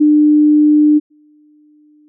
Two successive 300Hz tones are presented in stereo; Interaural phase difference of exactly 1/2 cycle (1800) results in a wider stereo image rather than in lateralization changes; must use headphones - no onset differe; Fundamentals of Sound